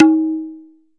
ROLAND SPD-20 PERC TABLA

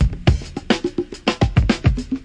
106.5 conga groove; 101 to 110 bpm

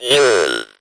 speak n spell heyaay, CIRCUITBENT; Speak and Spell

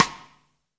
real snare; A Real Freakin Drum Kit

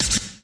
scratch snare; single hits

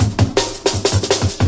162 bpm ambient breaker, drum loops and breaks

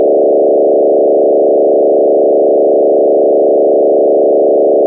laser treatment, Electro and Synthetic

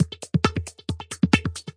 135 bpm smooth robot pipper, drum loop

